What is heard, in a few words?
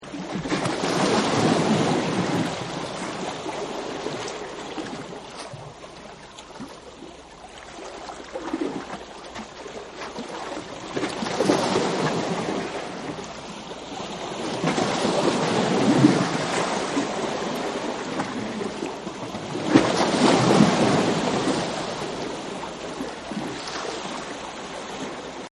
Waves Against Boulders; Ocean And Sea; Nature